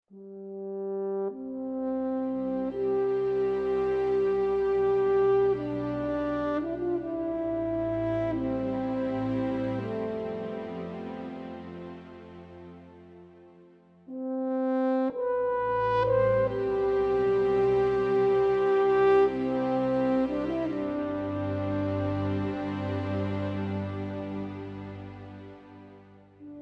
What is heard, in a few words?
Lyrical Horn; FHorn+Tuba